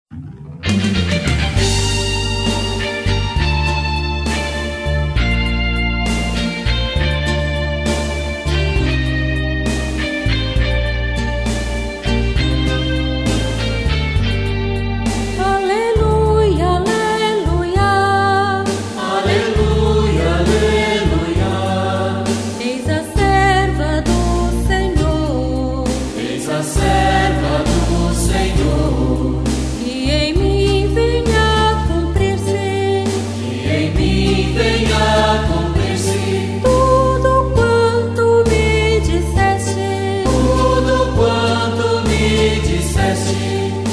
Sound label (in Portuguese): Aleluia Aleluiá; Advento